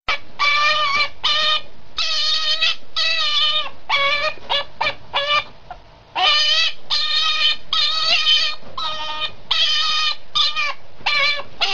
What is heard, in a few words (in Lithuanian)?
mazi reikalauja demesio, ferret